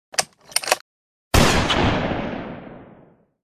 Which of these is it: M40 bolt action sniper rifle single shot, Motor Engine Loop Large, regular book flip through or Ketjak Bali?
M40 bolt action sniper rifle single shot